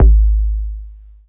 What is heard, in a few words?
bass sub; Ueberschall; Techno Trance Essentials